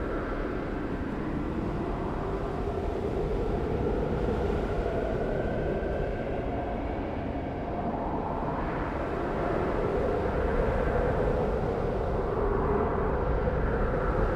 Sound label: Ruins Snow In From Roof Loop; Journey